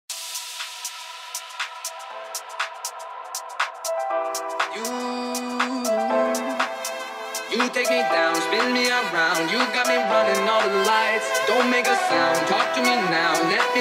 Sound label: electric violin dj collab